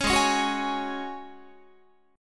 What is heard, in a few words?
Ab 7th Suspended 4th Ab down Guitar; Ab7sus4 strummed